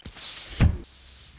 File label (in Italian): Chiusura schermo